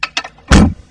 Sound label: door